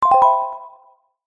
multimedia notification mallet synth dreamy

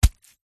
wet cloth dab squelch hard single; foley; zapsplat

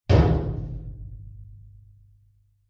impacts metal medium hit resonate lightly rattle

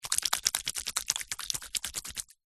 household hair gel rub squelch in hands; zapsplat

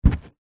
balloon inflated catch; foley

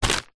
food bread small loaf plastic wrapped pick up; zapsplat

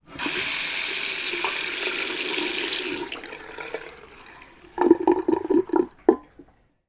household sink tap on water run off gargle; sound effects one

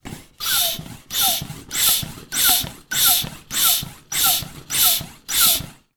household pump hand manual floor standing pumping

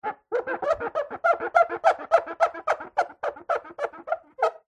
household fridge door clean wipe squeak

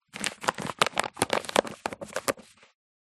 pamphlet paper roll up

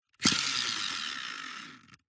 leisure rc car wheel force spin by hand

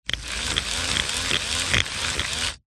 leisure toy remote controlled spider motor whirr

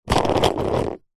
sport skateboard wheel spin air by hand; zapsplat

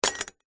industrial tool metal spanner or similar set down; zapsplat

